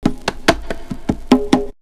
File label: Percussion; AMG - Coldcut's Kleptomania VOL-1